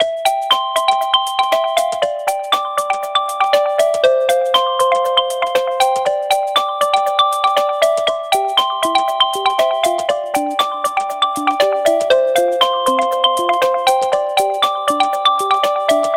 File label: Stock Ringtones; Mi Mix 2S